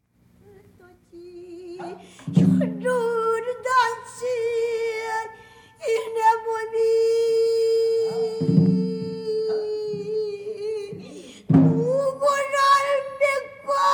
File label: P'ansori Flute